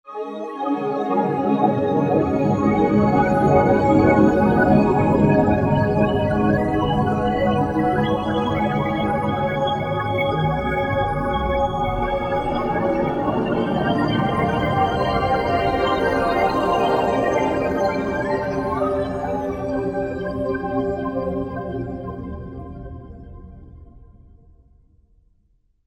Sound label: Undertones; Roland SRX-07 Ultimate Keys